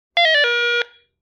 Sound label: Walkie talkie turn on push button beep